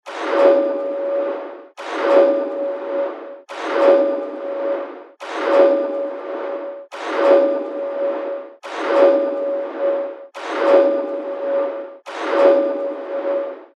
FX Loop C# 140 BPM; Rider; revealed fx loops vol 2

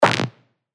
A, percussion; Gecqua; revealed percussion vol 3

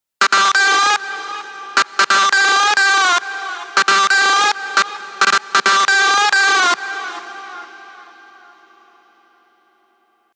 big room techno drops; Chemical Mechanica; Revealed Serum 2 Big Room Techno Drops Vol. 1

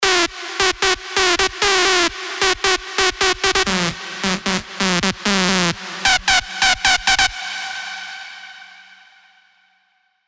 LD Heavy Weight; revealed spire mainstage drops

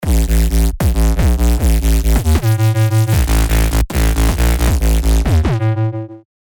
Dissected Sine; reese; revealed serum 2 reese renaissance

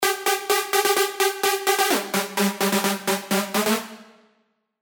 LD, sylenth1 dirty dutch leads; Klaplong; revealed sylenth1 dirty dutch leads vol 1

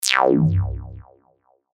Acid Shot C; synth shots tech house; Arena; revealed

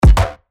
E, percussion; revealed percussion vol 1

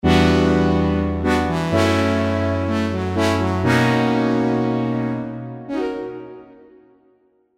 Empowered Harmony, keys; revealed diva keys vol 2

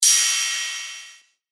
Ride, cymbals; revealed cymbals vol 1

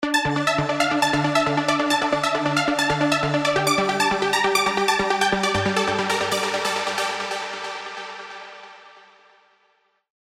Euphoric Sorrow, trance plucks; alonso spire trance plucks vol 3

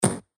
PERC3 Darken; revealed percussion vol 3

